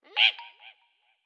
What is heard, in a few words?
Skeleton Aggro